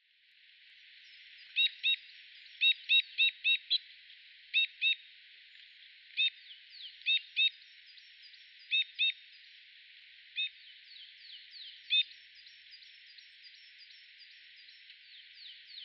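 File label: Two-barred Crossbill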